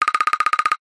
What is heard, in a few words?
cartoon bird woodpecker